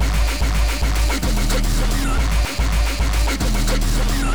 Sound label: rave, Hits N Stabs; Amen Breaks; Desecrated Productions Bass Sounds